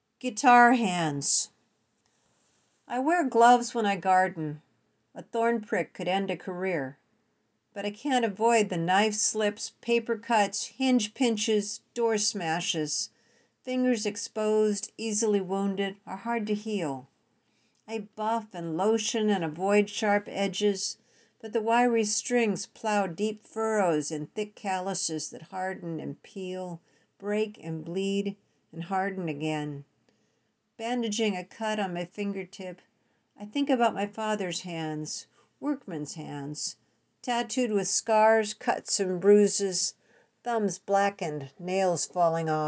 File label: Guitar Hands Lick